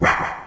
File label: spooky, notes